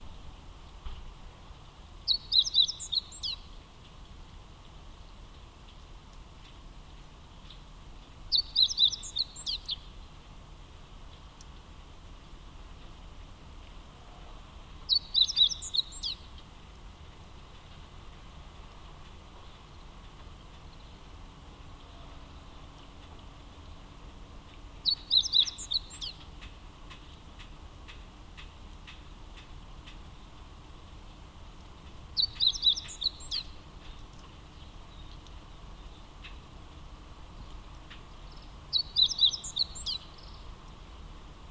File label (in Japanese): hoojiro; akasaka